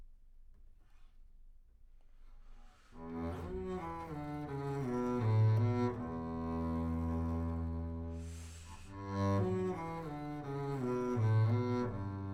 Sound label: Bass Room